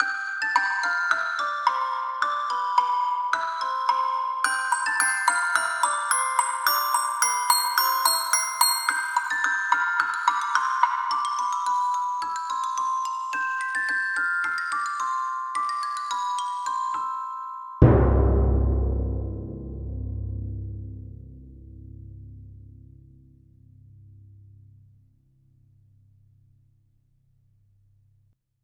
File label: percussion; hot lava; ios macos windows